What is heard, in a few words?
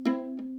Viola Non Vibrato Double Strings Pizzicato